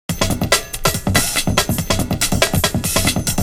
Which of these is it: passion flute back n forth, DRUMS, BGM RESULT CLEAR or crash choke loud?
DRUMS